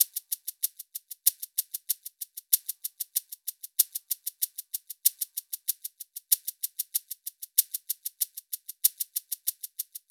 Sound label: Hip Hop Shaker; Digidesign; Pro Tools; Pro Tools Essential Demos; Essential Loop Demos; Demo Session - Hip Hop